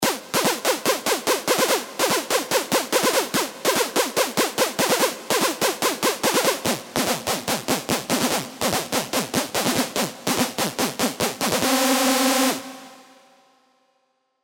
Screech; Group B; revealed serum 2 rave asylum

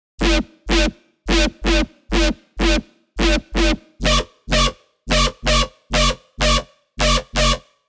BS, bass; bass tech house; Gimmie Moar; revealed serum 2 bass tech house vol 1

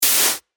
Clap Noise; revealed claps snares vol 2

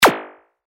percussion; Satire; revealed percussion vol 2

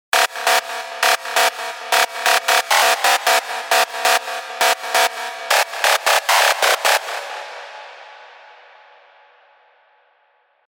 LD, Sylenth1; Godzilla Sighting; alonso 18 year anniversary pack

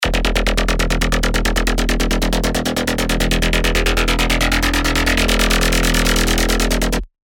Submarine Bass; rolling bass; revealed serum 2 rolling bass vol 2

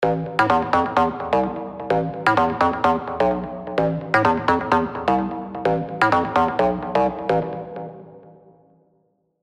Machine Swing, plucks; Revealed Serum 2 Plucks Vol. 1

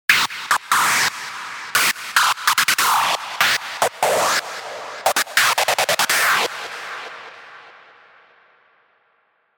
Screech Schranz, hard techno; Sonata; revealed sylenth1 hard techno vol 1

